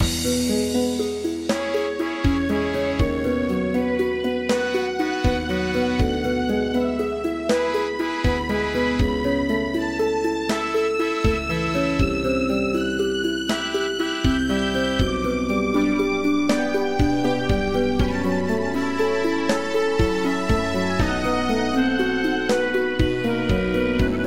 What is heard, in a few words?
Start; Sea Legends; MS-DOS